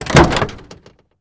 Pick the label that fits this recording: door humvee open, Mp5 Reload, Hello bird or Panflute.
door humvee open